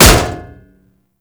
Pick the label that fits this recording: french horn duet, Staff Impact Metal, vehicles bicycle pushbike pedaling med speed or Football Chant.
Staff Impact Metal